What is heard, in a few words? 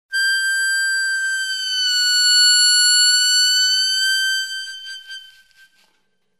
bend, harmonica